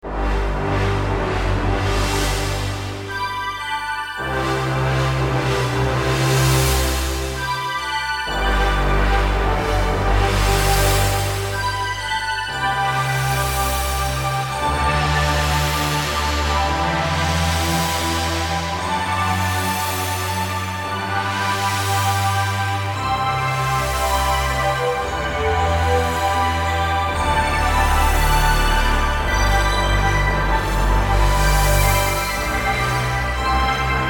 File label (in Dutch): droom synth